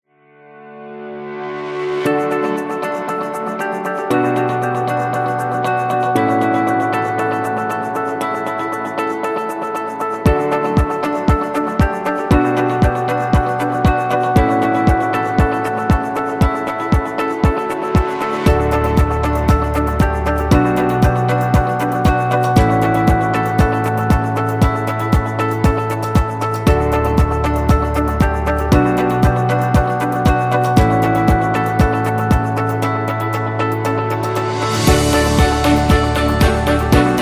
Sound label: Friendly Corporate, corporate music; corporate music vol 16